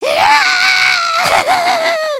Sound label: panic, emotes